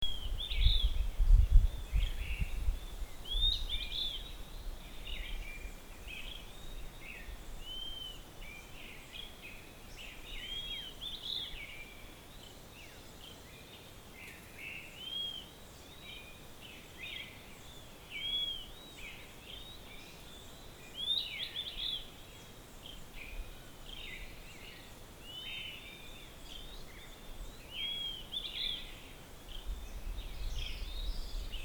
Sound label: Stolid dawn song